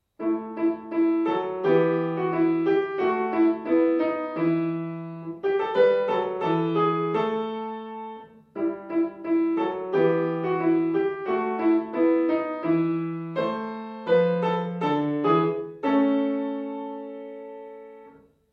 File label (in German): Tanzmelodie aus dem 16. Jh, Klavier